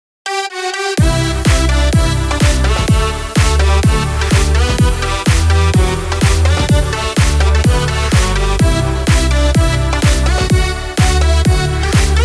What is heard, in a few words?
sample house track future bounce remix